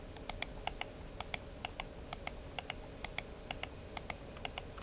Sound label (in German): Seitentasten